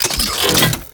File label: Blast Unequip; Warframe; Iron